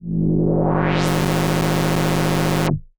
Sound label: Synth FX